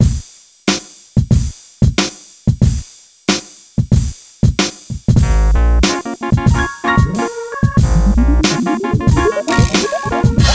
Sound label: Wurlitzer Track; NBA Street Vol. 2